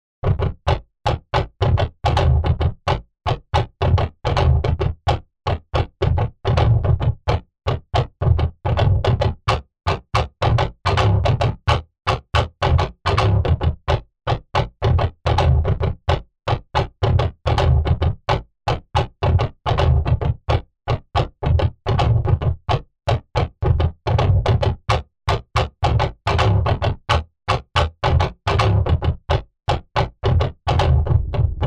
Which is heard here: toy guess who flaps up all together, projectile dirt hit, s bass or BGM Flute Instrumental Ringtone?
s bass